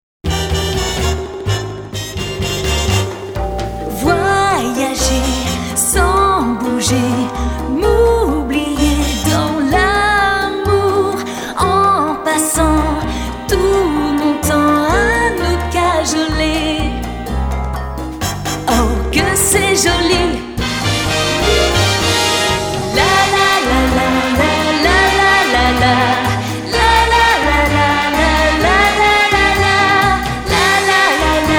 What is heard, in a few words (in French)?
Chant Pub; Hitachi; chanson maquette pub